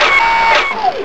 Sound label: reload, weapons; cyclone